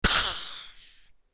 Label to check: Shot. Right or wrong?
Right